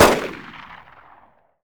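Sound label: 9 mm exterior shot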